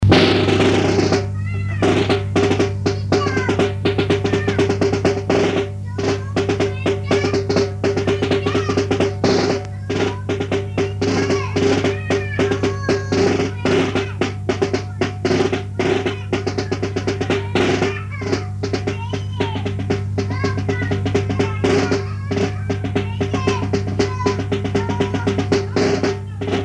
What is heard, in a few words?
QuickStep